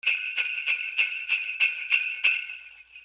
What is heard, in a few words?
BELLS